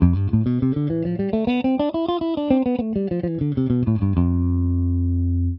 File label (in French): Fa Lydien, Guitare; Mode Lydien à partir de Fa : Tonique à la 1ère/13è case; Fa Sol La Si Do Ré Mi